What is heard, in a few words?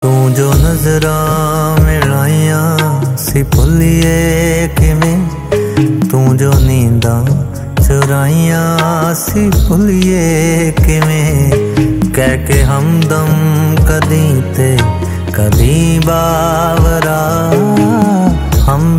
PUNJABI RINGTONE